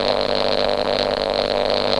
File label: noise